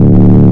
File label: BASS; SoundFactory